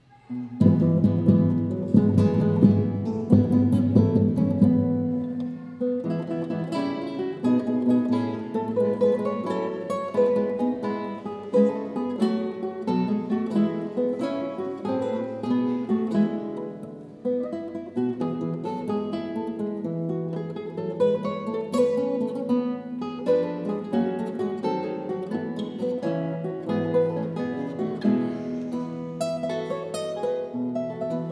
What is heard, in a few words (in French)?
Guitares Trio